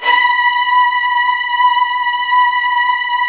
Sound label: HDS B3, Virtuoso Strings; ILIO; Kirk Hunter Virtuoso Strings VOL-1